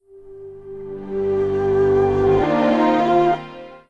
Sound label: Shutdown; Windows Never Released Soundpack 1